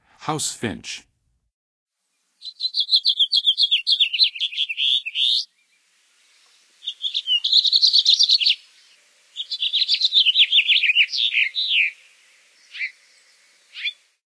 House Finch, Songs of Birds of North America; Western USA; Western USA Songbirds

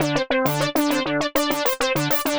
Skroink Pulse Synth, Keys; Rock

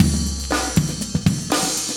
120bpm loops; Sound Effect Library

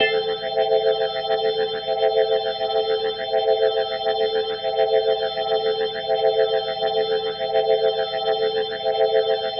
Glowing Organ A Min7+8va, Rock, Organ Licks

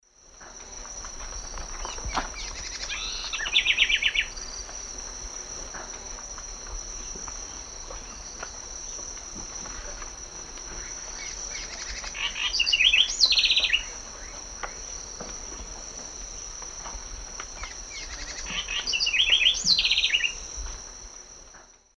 Troglodytes aedon, song; S. America; Eastern Lowlands

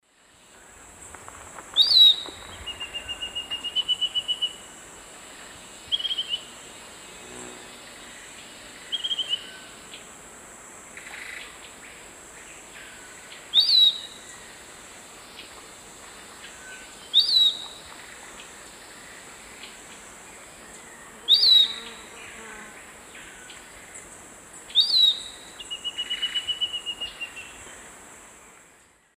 Legatus leucophaius, song; S. America; Eastern Lowlands

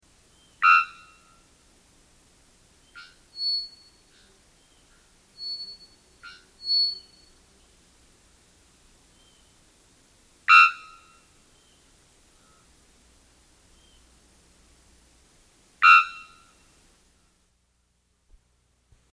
Three-Wattled Bellbird, S. America

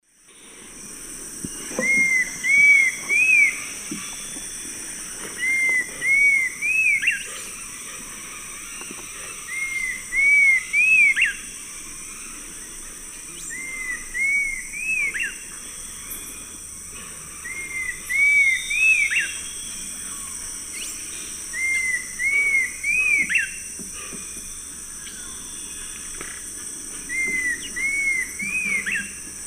Attila cinnamomeus, song; S. America; Eastern Lowlands

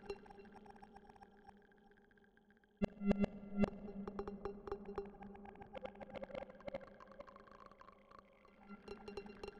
Warp Wave Drum; Rhythmic Atmospheres

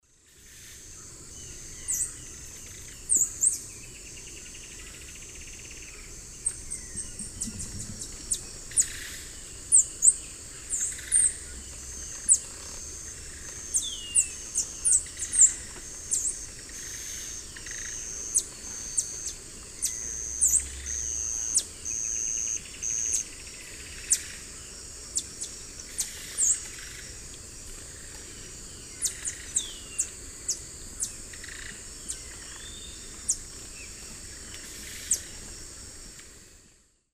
Parkerthraustes humeralis, feeding calls pair; S. America; Eastern Lowlands

